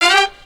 HIT, STABS, House

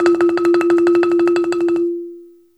BALAFON, African Melodic; E-MU Producer Series Vol. 7 – Old World Instruments

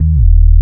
BASS, Drum & Bass, 170 BPM; Ueberschall; Drum & Bass Resonance

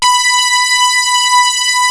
keytar; jazz jackrabbit 2

